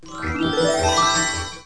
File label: harp; Dragon's Lair